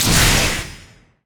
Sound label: guardian spell attack; odin